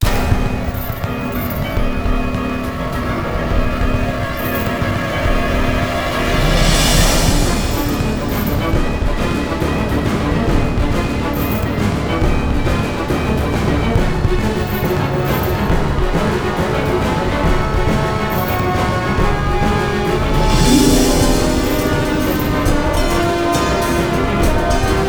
high speed chase; X2 Wolverine's Revenge; XBOX

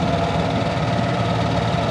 Loop, Engine, golfcart

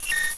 Computer Beep

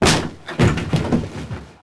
door wood kick; Max Payne

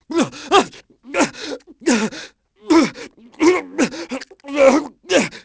struggle; Soldier Of Fortune II Double Helix; XBOX

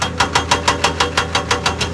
crane; WhiteOut

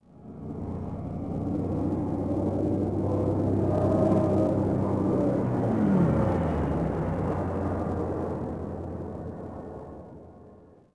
skyhopper passby; Star Wars Obi Wan; XBOX

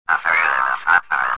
probe talk; Star Wars Jedi Knight II Jedi Outcast; XBOX

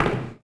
shops foot; Tony Hawk's Project 8; XBOX

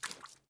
fs mud, footsteps

